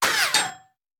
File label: Bullet Impact; Sound Ideas; Guns